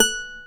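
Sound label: F#5 FLAMENCO, FLAMENCO guitar; AKAI S6000 CD-ROM; WorldSounds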